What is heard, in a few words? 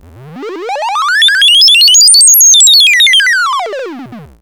synth sfx; Chillout (ambient1&2)